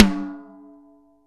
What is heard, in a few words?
snare dry; Real Mega Drums